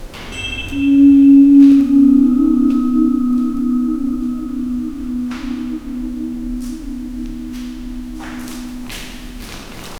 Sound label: inside old kerala temple, devotional